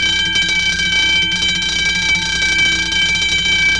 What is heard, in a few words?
alarm; valve; coil